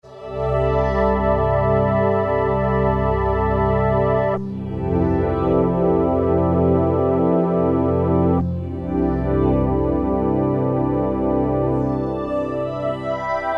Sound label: Soundtrack, Roland D-110; Factory Patches